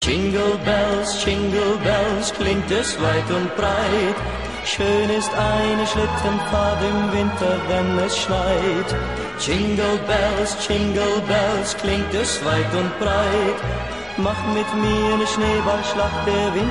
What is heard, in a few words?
Jingle Bells German version